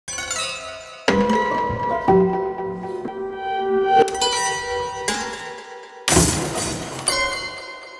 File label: Prepared Piano Loop